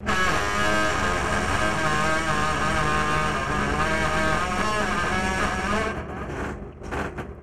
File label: turning right sound